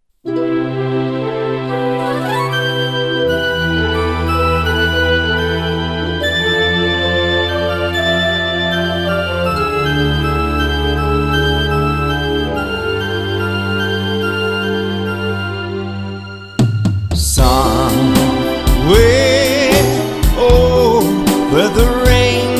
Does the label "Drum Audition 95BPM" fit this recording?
No